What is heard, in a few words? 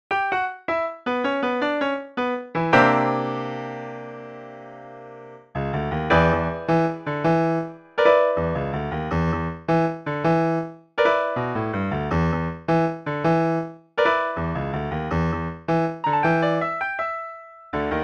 Rehearsel Piano